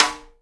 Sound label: Snare Rim; Mo' Phatt; Digital Sound Factory Mo' Phatt